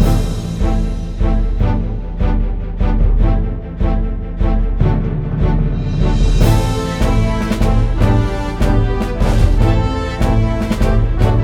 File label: Game Play BGM